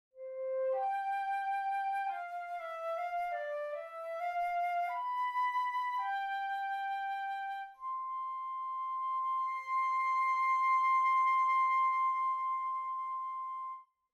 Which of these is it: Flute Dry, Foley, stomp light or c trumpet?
Flute Dry